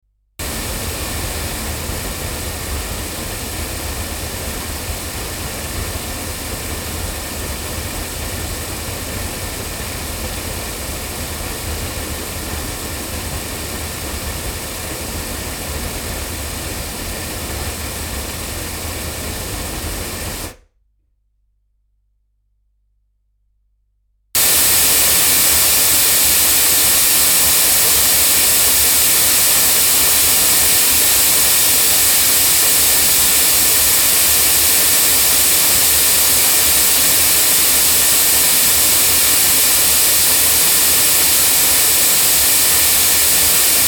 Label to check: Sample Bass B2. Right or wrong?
Wrong